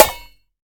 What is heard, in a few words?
sizzle steel snare drum sound d sharp key, Royality free acoustic snare sound tuned to the D